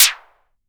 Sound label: effects, drum machines, FutureRetro FR777